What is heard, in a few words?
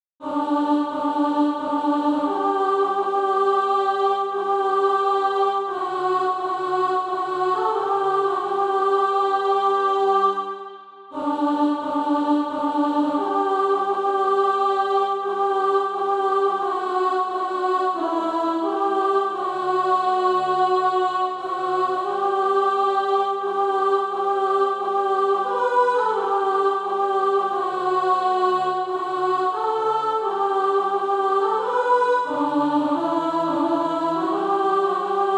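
alto; SATB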